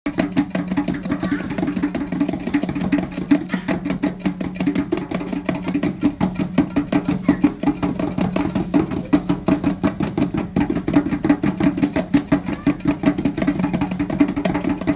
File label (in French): tambours